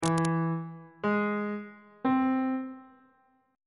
d double sharp augmented triad chord intervals on bass clef